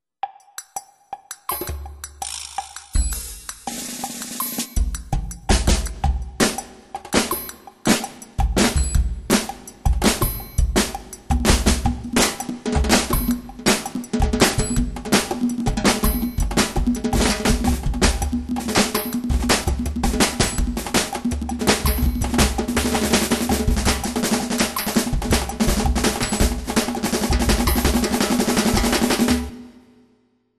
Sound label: Drum Rhythm